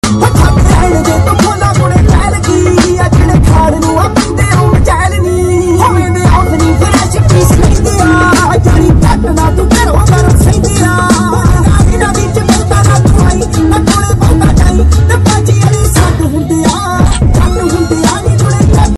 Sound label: DJ Punjabi Ringtone